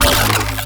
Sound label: Tazicor Updated Shot; Warframe; Moa Pet; Weapons